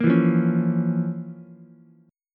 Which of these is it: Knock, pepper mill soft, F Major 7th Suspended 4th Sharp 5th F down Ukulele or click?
F Major 7th Suspended 4th Sharp 5th F down Ukulele